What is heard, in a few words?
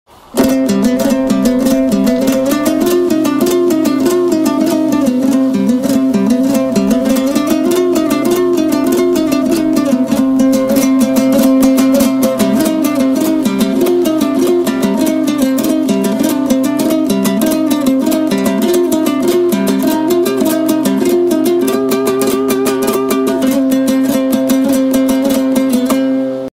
Komuz